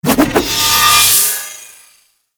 Staff Heavy Charge

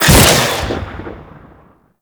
Shackle Cast; Warframe; The New War; Deacons

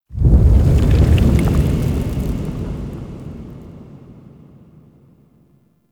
Dist Explosion Debris; Ambience; Warframe; Nokko Colony

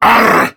Pain Prime Grineer Male; Tau; Battle Barks

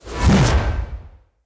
Spear Whoosh Heavy; Weapons; Enemy; Spears; NimrodSpear

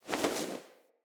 Textile Pickup

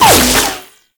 orb ricochet; Mod Quest; Warframe; The Teacher

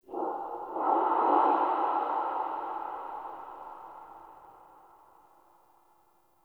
Sentient Lair Texture Interior Small, Ambience

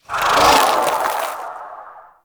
Embrace Siren Cast; Warframe; Broken Frame

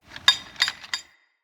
Tent Fire Pot Metal Creak; Soul Plane

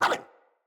Puppy Idle Bark; Enemies; Vocals; Kubrow

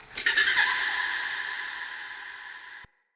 Day Pixies, Ambience

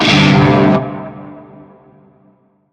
Shawzin Pent Maj Chord; Chord B; Music; Warframe; Narmer

